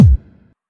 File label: dong; HitSounds; OpenTaiko